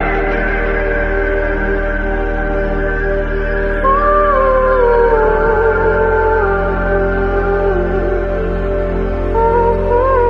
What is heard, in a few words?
keys and voice, Loops, Sad